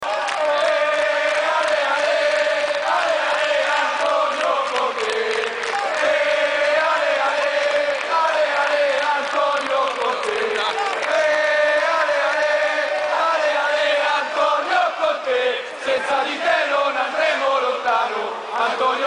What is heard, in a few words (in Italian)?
Coro